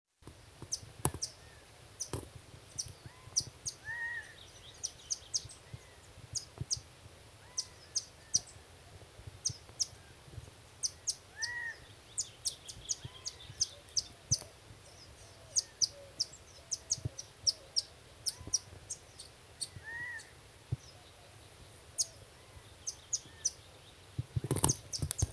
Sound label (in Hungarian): aranycinege hangja